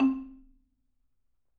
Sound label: C3, Marimba; Sampleheads; Dave Samuels Marimba & Vibes